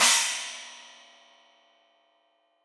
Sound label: China, Crash, China Cymbal; Deeply Sampled Drums; Cymbals Stereo